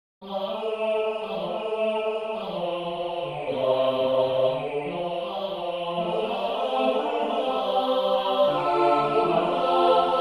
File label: midi